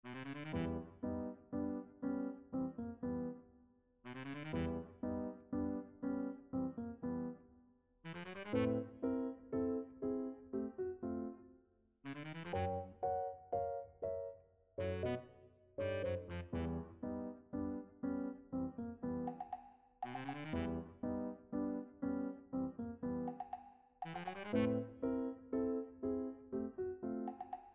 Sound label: Tango; MIDI